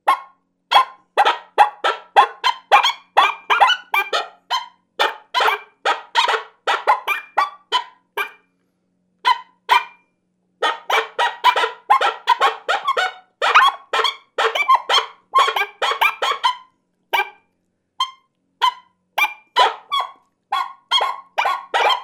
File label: Coyotes on Kill; Dave Kelso